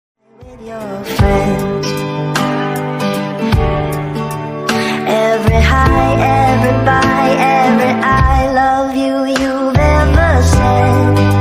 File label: english songs slowed speed up